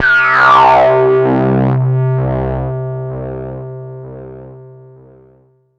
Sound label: lead C3, Korg MS-20; Polyend; Simulacrum Sounds; 1 Shots; Synths; Leads and Keys